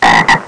Single frog croak

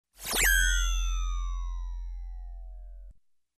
Laser Drop

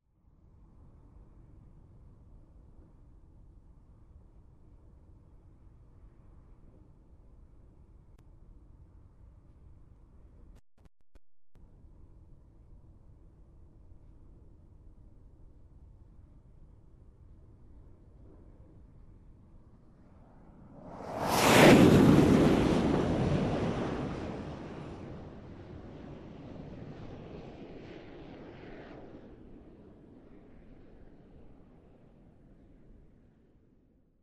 Jet Whirring Sound

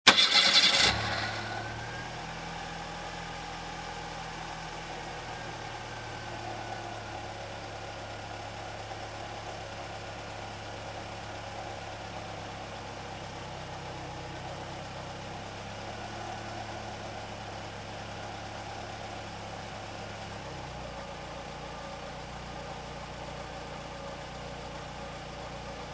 Car engine running idle

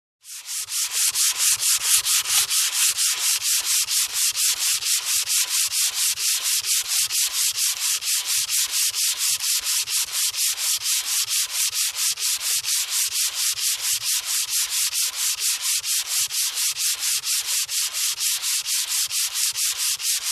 Construction

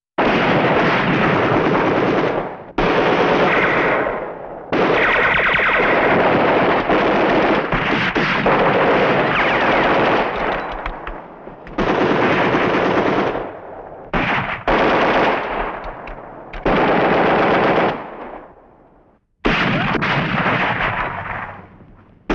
Gatling Gun with Laser Shots

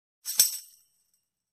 Hitting the tambourine

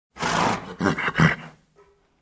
Horse Neigh with breathing

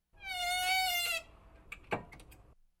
Squeaky Cabinet Door

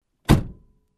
Car Door Close

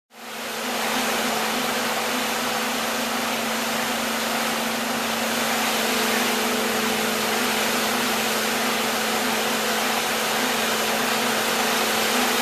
Vacuum cleaner noise

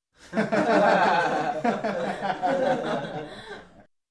Group of individuals in a room laughing

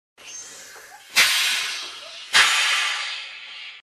Propane tank hiss

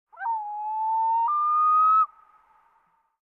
Nighthawk Hooting

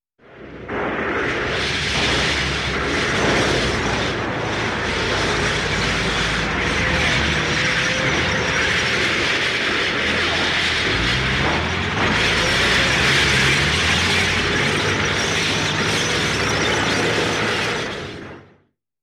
Heavy Tank Roaming

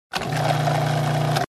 Mixing Device running

